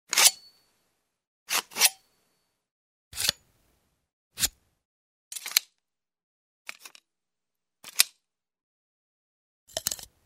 The bayonet is taken out, sheathed; cold weapons

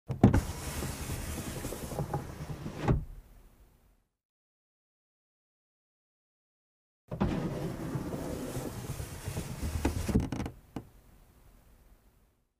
Car glass lowered, raised manually; windows

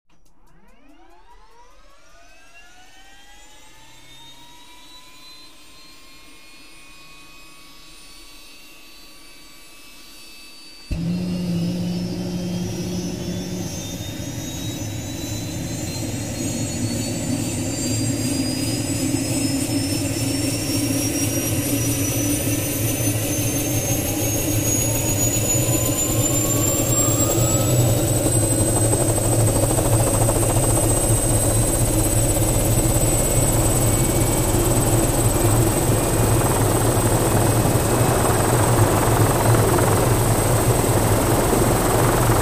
Helicopter starts inside

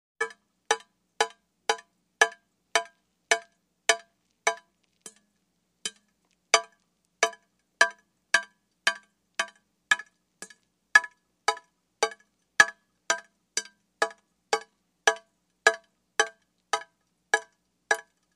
Water dripping into a metal sink

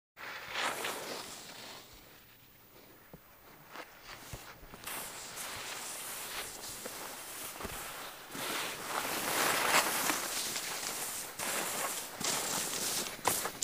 Skiing in the snow

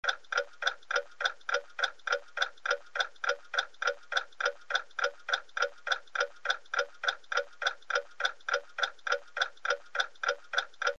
Ticking of a mechanical clock; watches

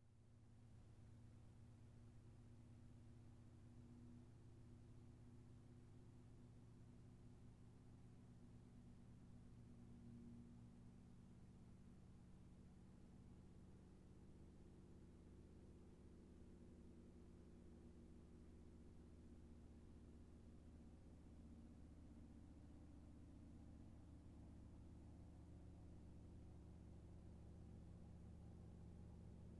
Motorcycle approaching, turning off

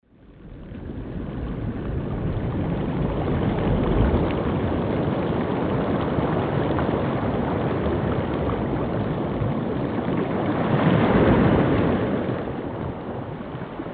Waves of the sea, ocean

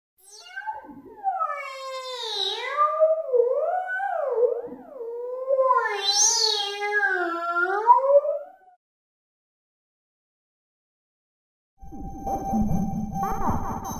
space alien radio signal; radio signals

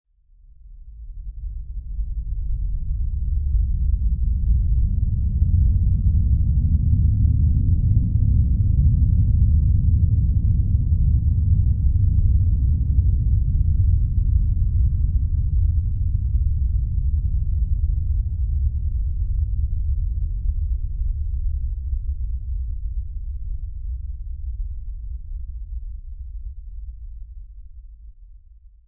Submarine sails underwater

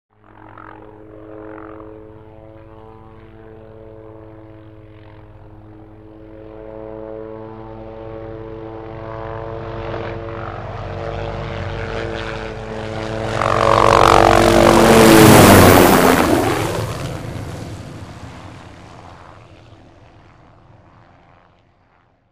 Helicopter flies fast; Helicopter flies fast 10

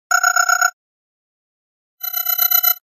Digital audio signal

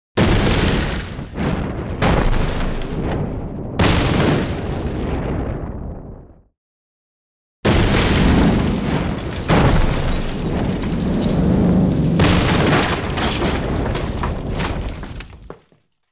Different explosions with shrapnel

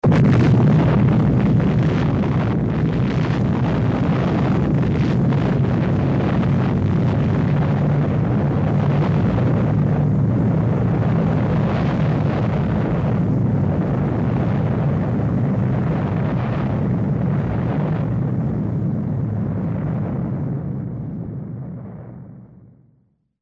Atomic bomb explosion; explosions

